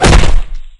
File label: taller player punch; cof